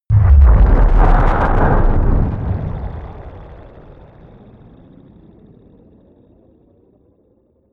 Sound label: stone breaker; whooshes rises; hits impacts